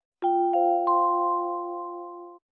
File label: emerging, huawei harmonyos